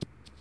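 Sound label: ball grass; hb6demo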